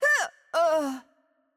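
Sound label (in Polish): Hurt Heavy, Polish; pl PL; bloom minigame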